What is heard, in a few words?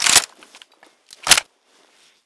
mp5 clip out; garrysmod